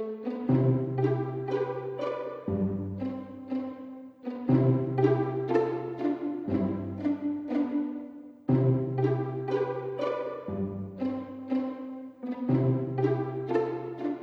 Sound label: music